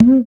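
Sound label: conga, African Perc; Spectrasonics; Supreme Beats; African Contemporary; SB Afro DRMs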